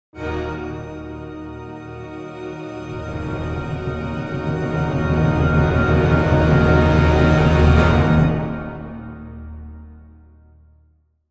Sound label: dramatic orchestra end